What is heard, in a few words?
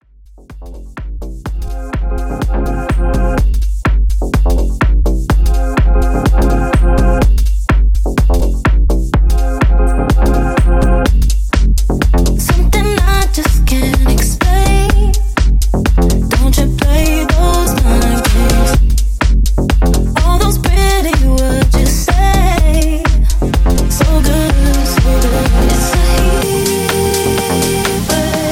Extended Mix 125 Bpm